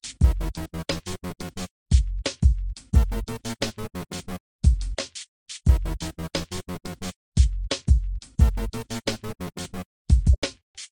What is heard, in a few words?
boombox music